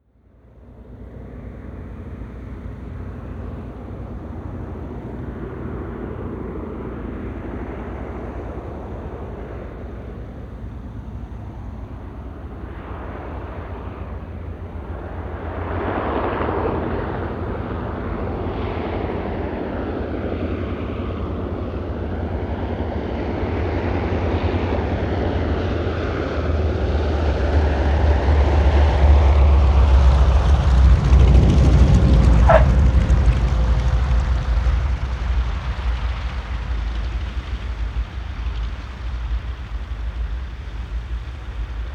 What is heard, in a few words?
propeller plane ju52 approach land long skid